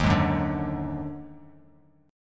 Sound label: D sharp Minor Major 7th Double Flat 5th D sharp, down, Bass Standard; strummed; chord